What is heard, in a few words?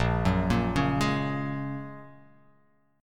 A sharp Minor 7th Sharp 5th A sharp